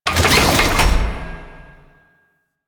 Customization UI Close; Veilbreaker; Kahl

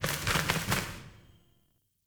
Sparrow Death State Four Wing Flaps; Animals

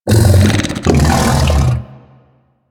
Undazoa Vocal Tranquillized, Hunting Critters; Warframe

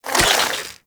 Gas Arrow Tether Attach, Weapons; Warframe; Infested; Derelict Cernos Prime

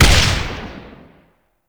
Board Sword Explosion; Sundial

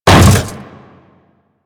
Hammer Boss Step Default, Footsteps

